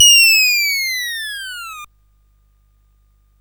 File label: Paddle Mania, CSG8580R5